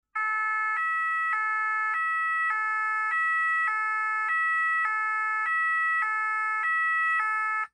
Francia ambulence; 911 Signal

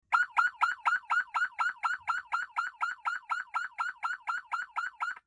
Yelp; 911 Signal

